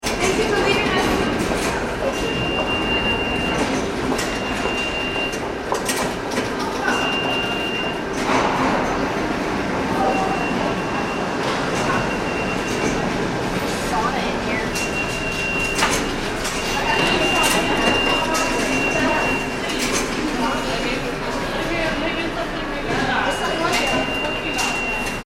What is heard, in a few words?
ambience train station subway nyc ticket barrier; Subway Train Station ( New York City ) Ambience - People Walking Through Barriers Close; Subways; Vehicles; McKinney Sound